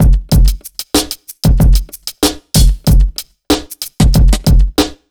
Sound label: BEAT L, Pure Electro; USB Soundscan vol.03; AKAI